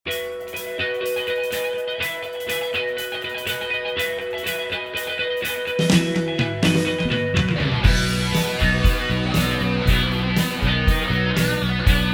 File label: basic shapes song instr; musical play